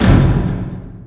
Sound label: chimera attack